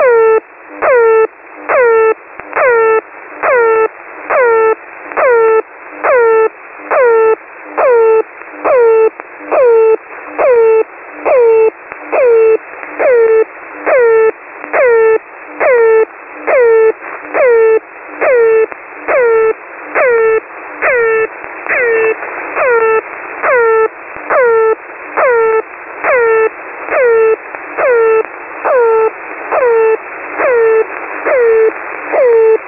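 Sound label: 4020khz, Russian military voice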